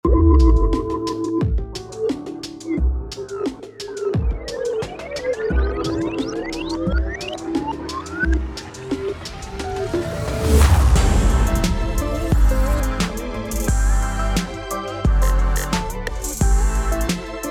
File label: beat battle